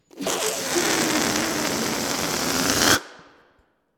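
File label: Balloon Fart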